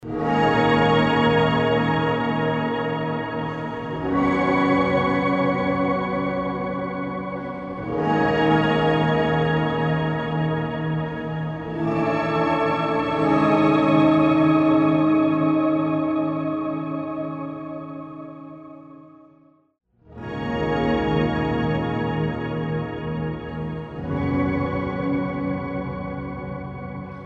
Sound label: octronix shimmer